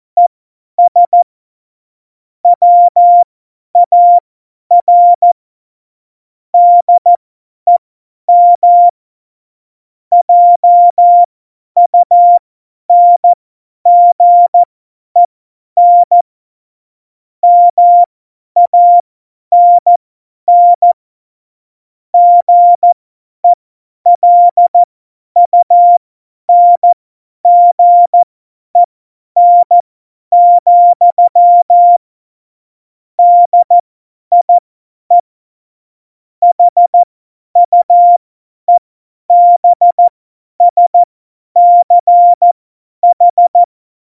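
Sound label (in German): Witz, Tempo 70, Morsekurs, cw morsen; Witze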